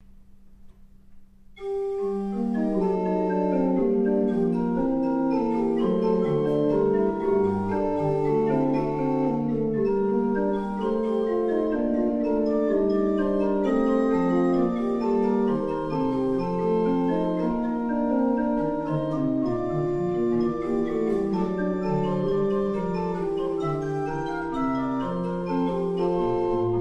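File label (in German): Godefroy Hartmann 17 II P, pfeifenorgeln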